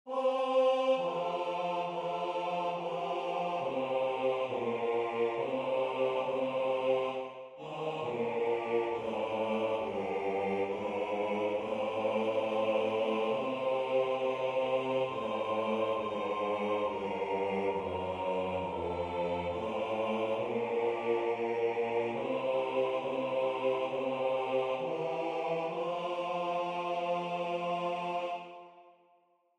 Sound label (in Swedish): bas; Nu tandas; Julotta